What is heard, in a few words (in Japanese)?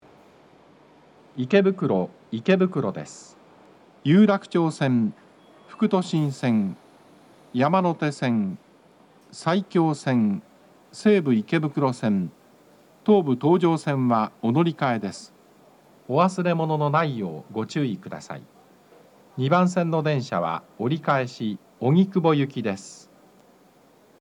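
ikebukuro arrival melody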